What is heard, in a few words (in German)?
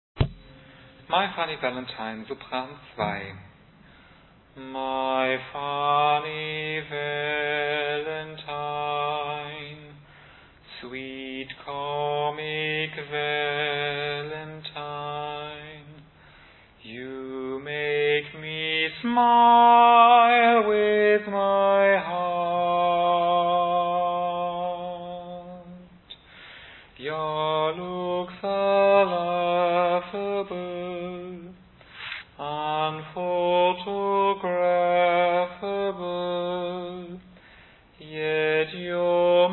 Sopran